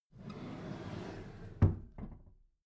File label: packed drawer smooth clatter close soft